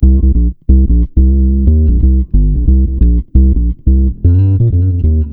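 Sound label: C minor, Bass, 90 BPM; Sampleheads; Ultra Freakin' Bass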